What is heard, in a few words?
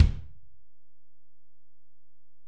Ac BD, Alesis DM-PRO; 300 Drum Machines